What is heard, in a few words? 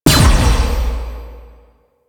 Player Weapon Projectile Parry; Warframe; Duviri; Duviri Dax; Weapons